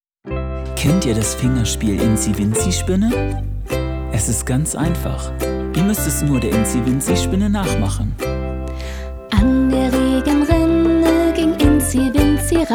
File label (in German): Inzi Winzi Spinne, Kinderlieder, German